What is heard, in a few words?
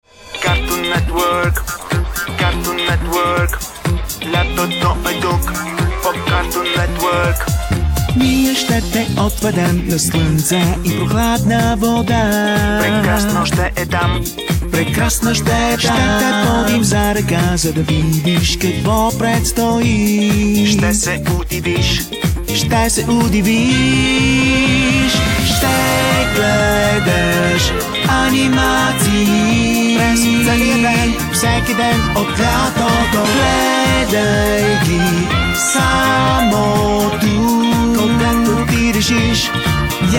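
Bulgarian male voice over singing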